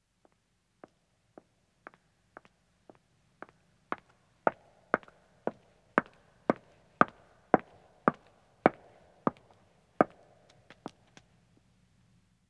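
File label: Stone Walking, 1 Woman Approaches, Footsteps; BBC Sound Effects Library; Footsteps II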